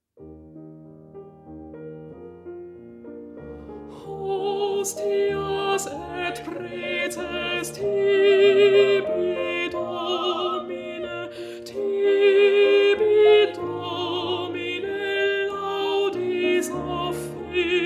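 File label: alto; hostias; schola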